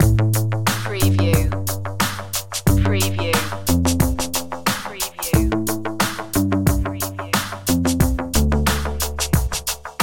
hip hop loop

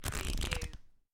Playing Cards Fan Flip

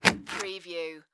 Three Hole Punch

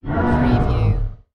Dinosaur Velociraptor

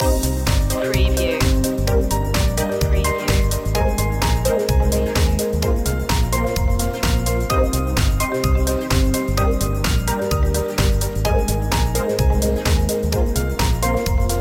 up to this beat loop

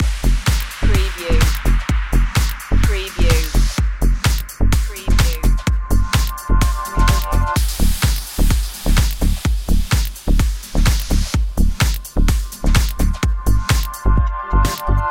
underwater beatz loop

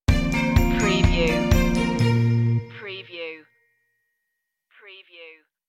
working stinger

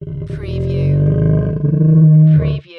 Dinosaur Groan Moan

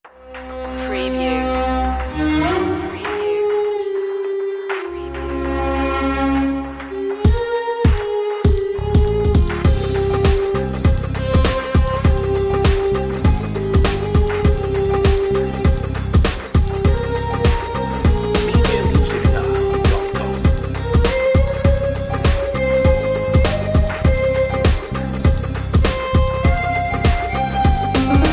bollywood vibes

